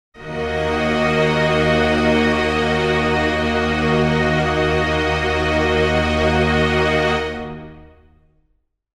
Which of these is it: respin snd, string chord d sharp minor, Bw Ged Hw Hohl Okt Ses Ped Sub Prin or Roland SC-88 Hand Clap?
string chord d sharp minor